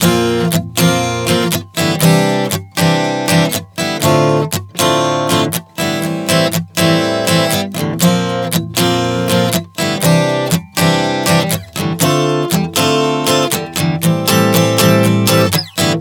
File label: Bb-C-Dm-Am 120 bpm, acoustic guitar chord progressions